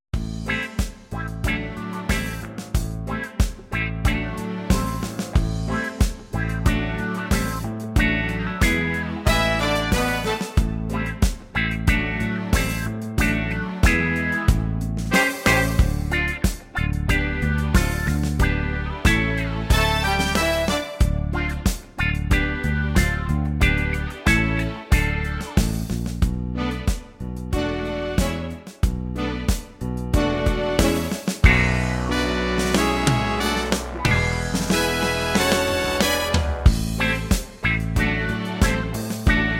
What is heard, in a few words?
backing track